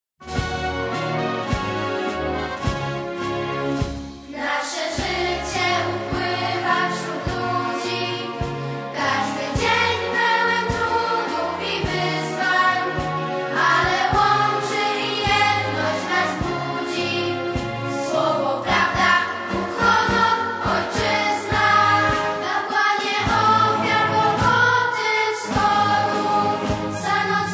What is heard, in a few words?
hymn